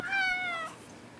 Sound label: Cat Meows - Food Time; Micro Pack